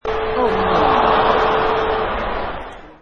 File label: Arena Crowd Noise